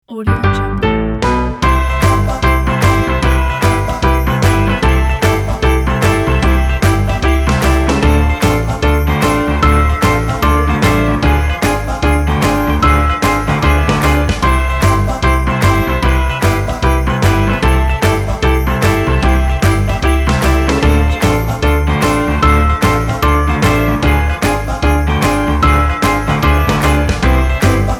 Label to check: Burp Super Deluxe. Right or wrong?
Wrong